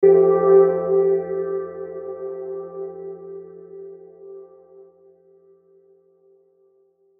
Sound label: Logo Tonal Swell Flange; Logo Tonal Swells Reverb Flange; Sonic Logo